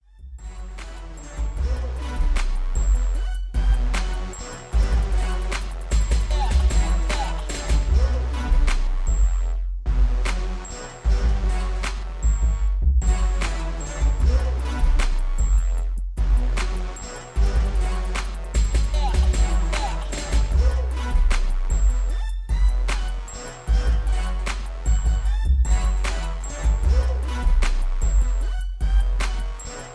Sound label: 76BPM